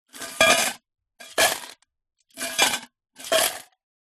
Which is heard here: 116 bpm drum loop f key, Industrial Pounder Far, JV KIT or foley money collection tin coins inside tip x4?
foley money collection tin coins inside tip x4